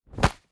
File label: monk strike; Shelter